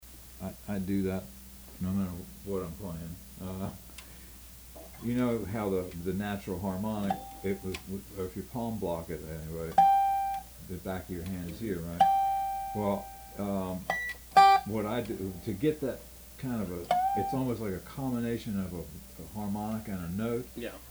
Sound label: 8 string